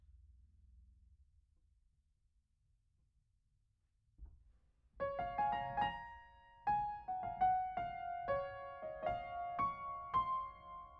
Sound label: Piano Mono